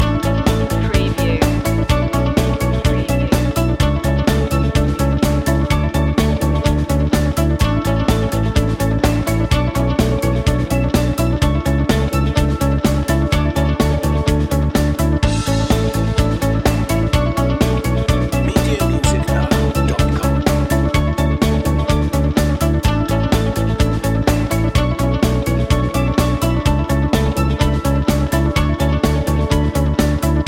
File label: inspiring day loop